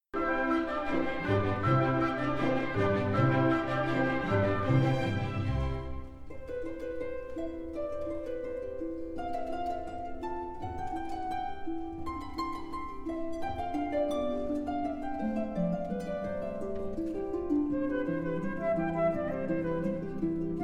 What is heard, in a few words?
Flute Harp Con C